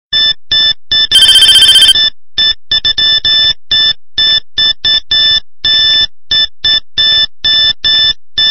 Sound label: delkim drop back